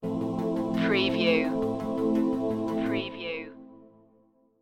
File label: slow dawn stinger